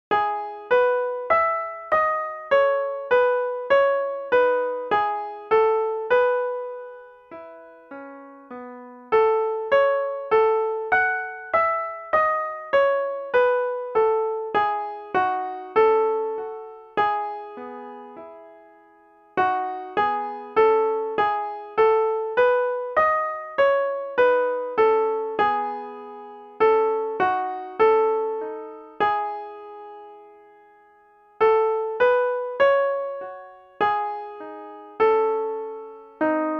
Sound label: morning prayer soprano instrumental